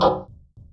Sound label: footsteps mech